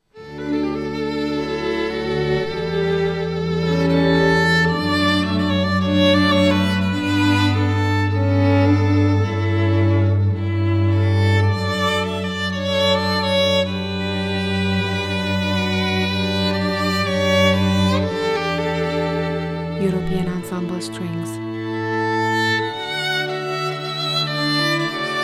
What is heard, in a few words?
Oboe